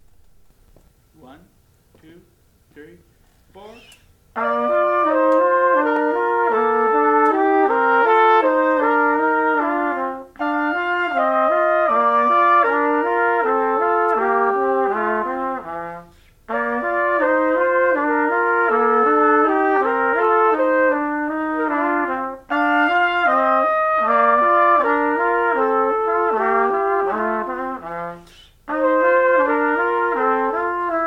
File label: melody duet; schumann